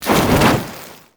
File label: World On Fire End; Warframe; Ember